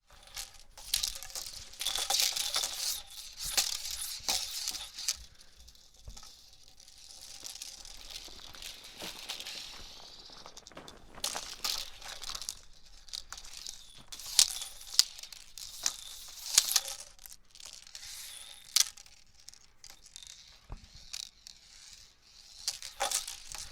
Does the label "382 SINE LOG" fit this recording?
No